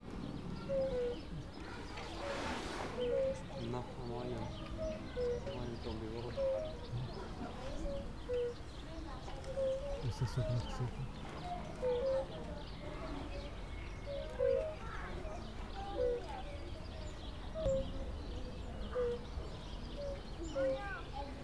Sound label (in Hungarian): fiatal állat kecskét elkerget, afrikai elefánt; állathangok; nyíregyházi zoo 2010